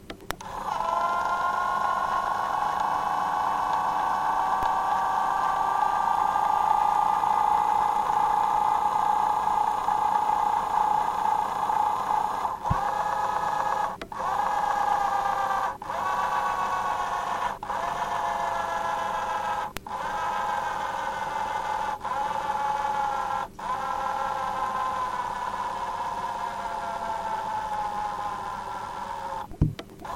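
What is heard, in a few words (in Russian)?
motor noise small variable speed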